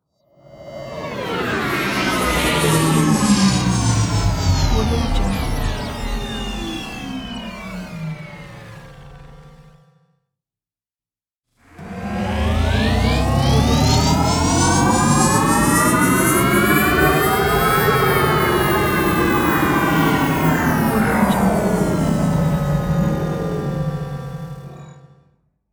spaceship engine

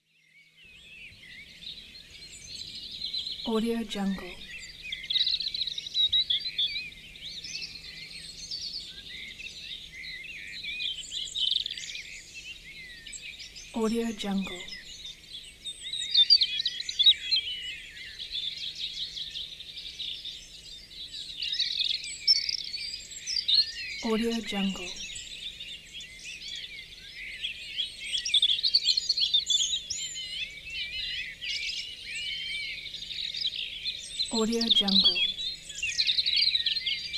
birds singing

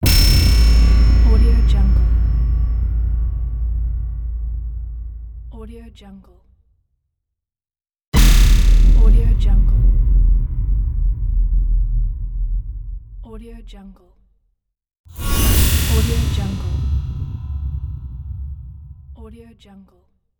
scifi impact

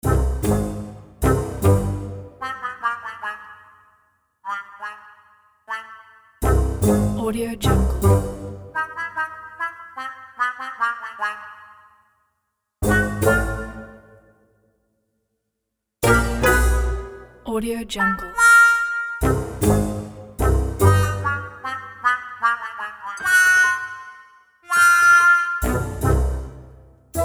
comedy dramedy sneaky; flossiewood

